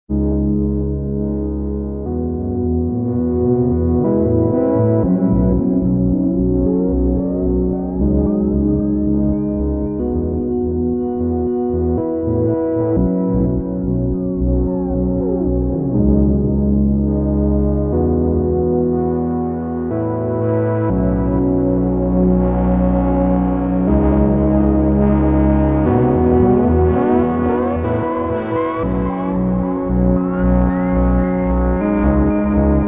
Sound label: Sequential Pro 3 Ambient Peace